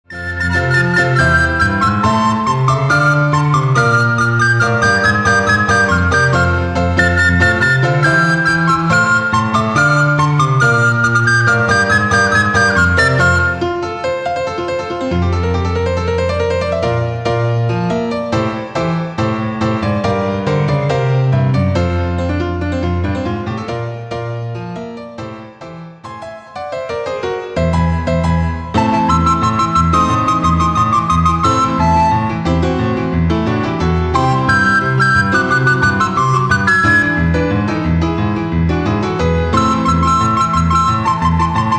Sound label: recorder sample